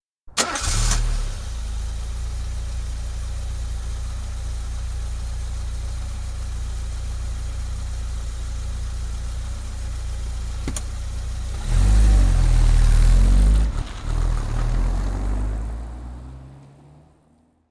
Vauxhall Carlton, exterior, switch on, depart (18 litre saloon, 1986 model); Car Sound Effects; BBC